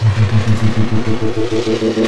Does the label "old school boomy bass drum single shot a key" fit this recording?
No